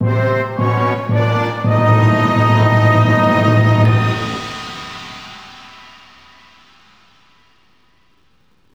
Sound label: fanfary; Left in the Dark: No One on Board